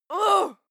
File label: female fight shout exert; schreie; menschen